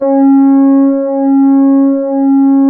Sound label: SOFTLO C3, SYNTHS; OBMX-SYNTHS